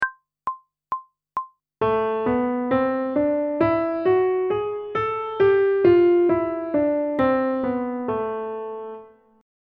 Minor Scale (Melodic) one octave ascending and descending; pop vocals; grade 7; Technical Work